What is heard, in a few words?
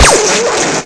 laser